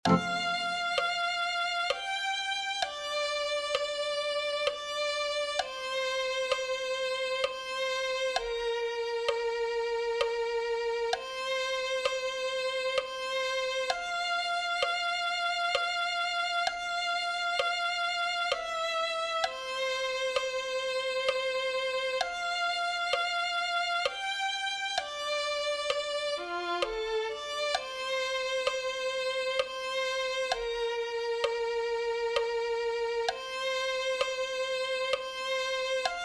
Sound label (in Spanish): cristo amante de mi ser violin